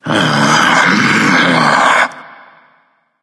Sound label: grumble; smite; hebo